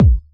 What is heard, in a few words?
hard bass drumz